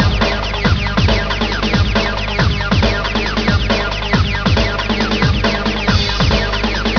techno

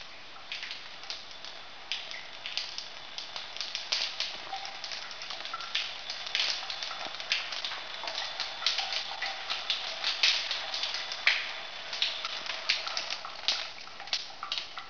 water splatter fast

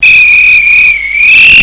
whistle; ponteduhoc

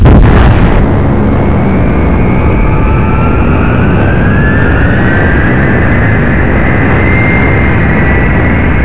windup

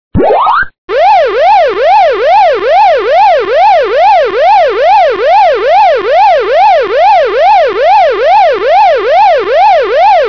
powerup; duckhunt; pac

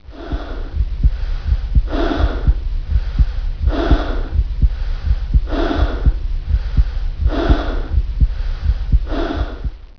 heart breathe fade

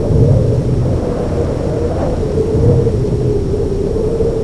wind; dune

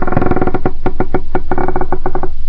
wall creak

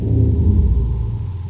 pulse

